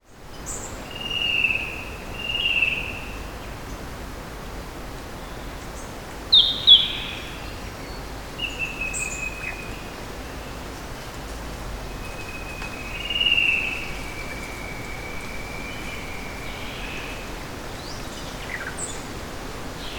nature ambience rainforest australia autumn wind leaves fall birds